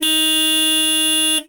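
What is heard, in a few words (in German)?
hupen klingeltöne lkw auto oldtimer; kfz autohupe sound; hupen klingeltoene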